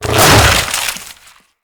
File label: Infested Ancient Tank Single Tentacle Swing; Emissary Ancient Tank